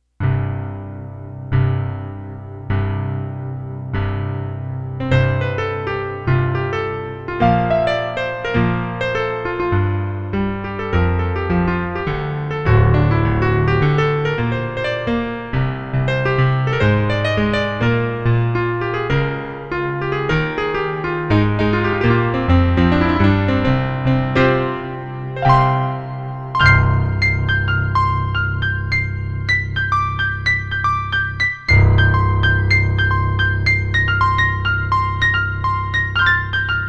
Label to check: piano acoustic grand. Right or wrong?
Right